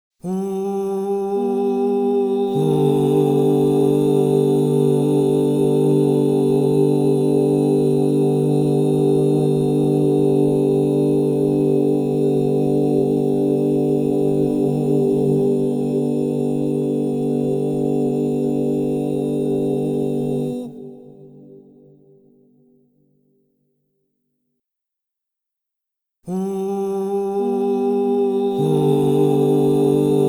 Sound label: Sol major, crystal bowls; Yoga and sound